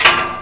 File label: metal